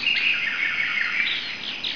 JUNGLE; animal

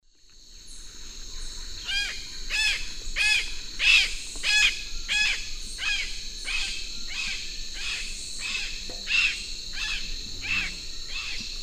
Daptrius ater, flight calls; S. America; Eastern Lowlands

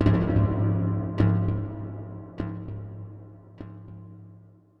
Planet Tracks, Minimal Planet Drums; Ambient Cinematic

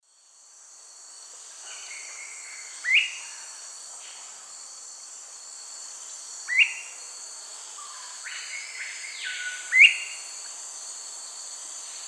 Turdus ignobilis, calls; S. America; Eastern Lowlands

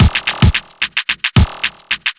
block rock kit

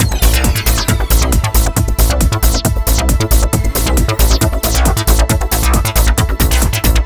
ACID TECHNO

